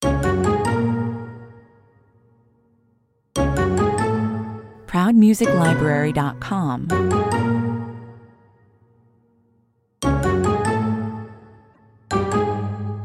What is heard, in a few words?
Punctuated, Dynamic, Offbeat